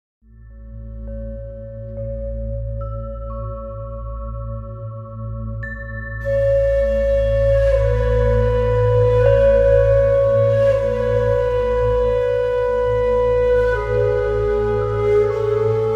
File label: flute of mother earth; saumya yoga; Meditation